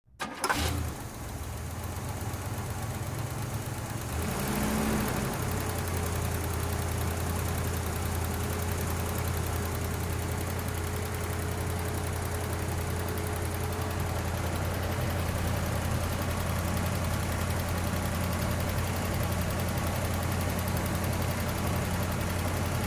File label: Car Start, Idle With Bad Lifters, Cough Off